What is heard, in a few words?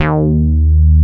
dance bass; Sound & Vision - Gigapack II CD 1